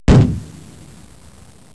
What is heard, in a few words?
EXPL; explosion sounds